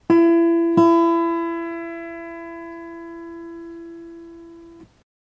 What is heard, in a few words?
tuning